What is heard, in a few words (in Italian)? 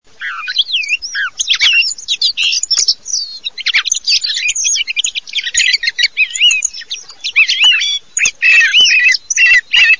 tordo, birdsound